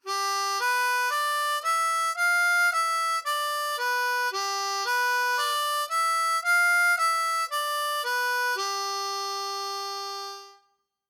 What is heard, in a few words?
Cross Harp Exercise